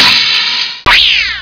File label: LASER